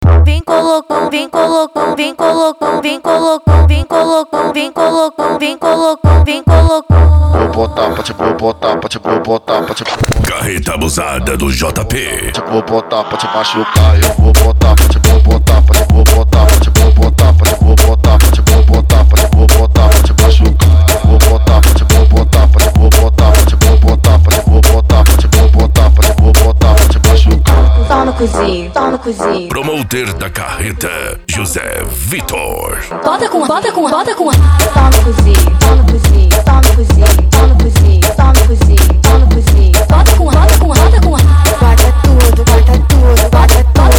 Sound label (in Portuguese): funk mega bass